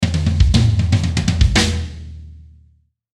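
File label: Musical Element, Drum Snare March; Blastwave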